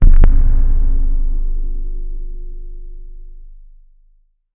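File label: cinematic sub hit with deep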